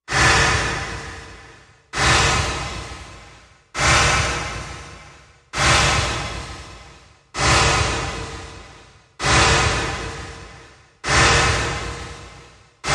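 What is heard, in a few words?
Pink Noise Maker Machine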